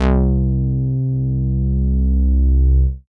CONCRETE BASS; Masterbits; Dance Trax

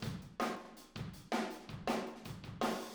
Room, Drums, 162 BPM; Drums from the Big Room The Mixes; Take Your Toms Off; Verse

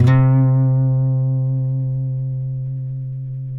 FINGER, Guitars; TAKA

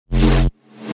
oneshot growl, bass

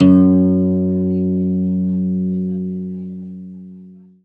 ZITHER BAS; Best Service; Alpine Volksmusik

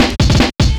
fill vinyl; fills

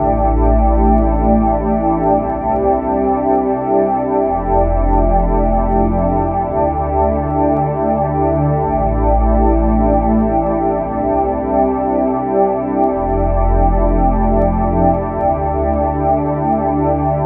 pad new age; Sonic Foundry (Sony Creative Software); Ugly Remnants VOL-2

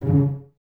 CES C#3 Pizz, Cellos, String Ensembles; Miroslav Vitous

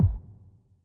snap, Yamaha Tenori-On Snapkit

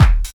combi drums

